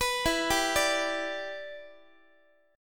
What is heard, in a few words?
E Minor 7th, down, Guitar; arpeggiated